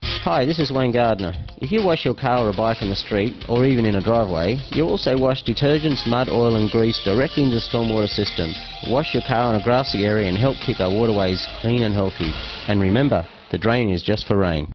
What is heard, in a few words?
car